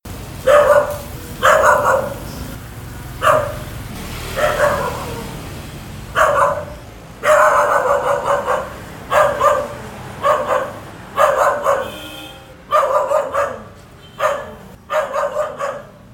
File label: random dog barking noise